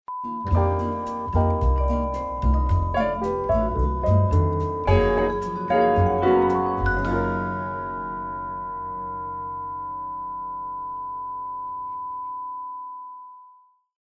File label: groovy jingles